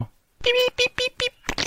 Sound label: avalanche lol